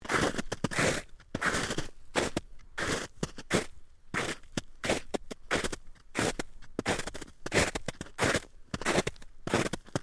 FOOTSTEPS SNEAKERS SHALLOW SNOW SEQUENCE STEREO, Walking in sneakers or runners in light snow